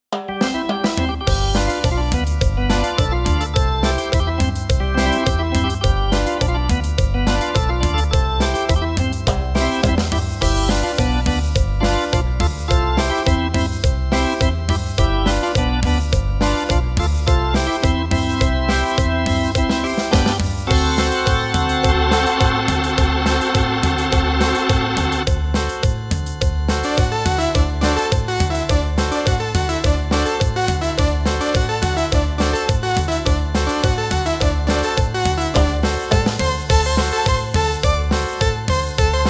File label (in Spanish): Cumbia